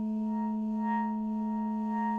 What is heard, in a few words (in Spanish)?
Multiarmonicos